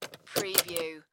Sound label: Auto Key Insert; sound effects bible